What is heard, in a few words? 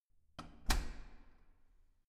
corridor door soft close